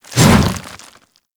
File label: Infested Charger Leap